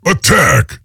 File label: attack; awesomenauts; Deadlift